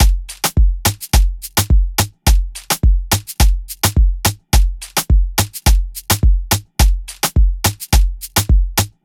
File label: Drum Loop - 106 BPM (Afrobeats); Cymatics; PARADOX II; Drum Loop Collection